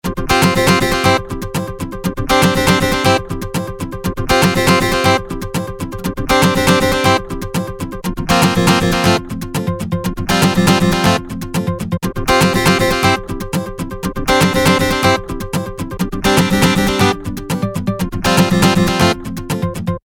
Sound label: Western